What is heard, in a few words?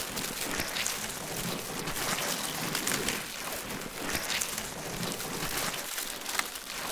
vines Environment; jumanji